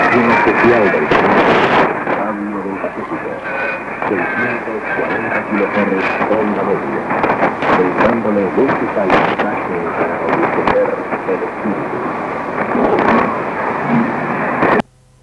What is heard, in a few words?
radio, cassette, Peru; rdelpacifico